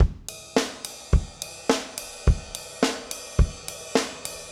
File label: Garage Funk Drums, 70s Analog Funk; Sonic Foundry (Sony Creative Software); What it Is!